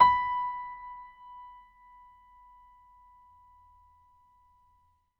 MF63 R, Acoustic Piano; InVision Interactive; Miroslav Vitous

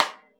RAW RIM, Drums Acoustic; AKAI; 90s sample CD; USB Soundscan vol.10; RAW KIT 3

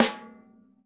SD1 -R, Drums Acoustic; AKAI; 90s sample CD; USB Soundscan vol.10; RAW KIT 4

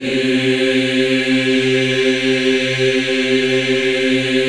C3, Choir

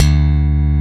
Slap Bass D1; Proteus 2000; Multisamples; Digital Sound Factory Proteus 2000

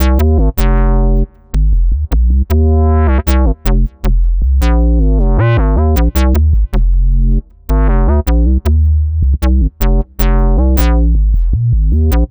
Synth Bass

